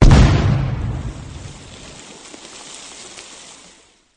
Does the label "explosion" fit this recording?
Yes